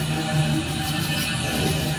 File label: spiders bossfight drain charge loop